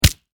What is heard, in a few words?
Impact Punch Face Meaty Flesh; Punches and Hits; Combat